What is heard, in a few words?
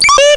voices; Instruments